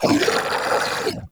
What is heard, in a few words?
Flying Mouth Death; Enemies; Warframe; ManInTheWall